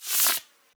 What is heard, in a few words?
swapper clone collect; The Swapper